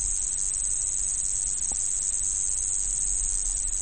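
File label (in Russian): цикада резкий близкий нудный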